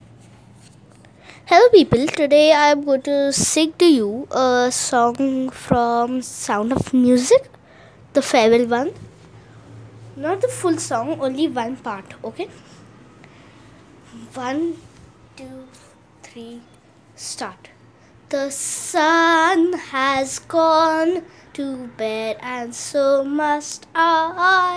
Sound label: Farewell Song of Music, singing